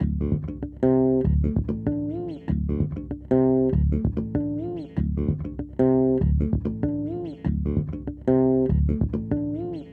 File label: Bass power riff; raji an ancient epic